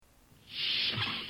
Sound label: doors